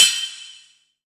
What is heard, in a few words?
ride sound clip G key, Royality free ride cymbal drum sample tuned to the G note